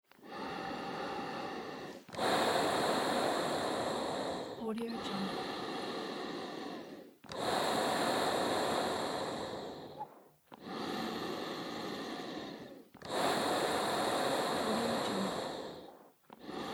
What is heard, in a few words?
gas mask breathing; نفس کشیدن با ماسک اکسیژن; human sounds